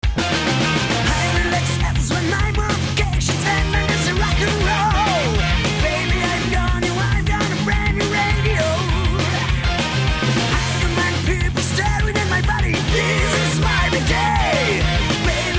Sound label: tipsy rock